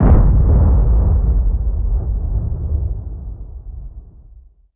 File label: Bomb; cstrike; de torn cz